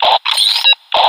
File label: status tick novice; ui